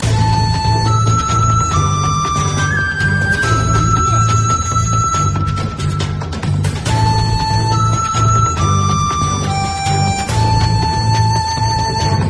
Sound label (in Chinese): 普通战斗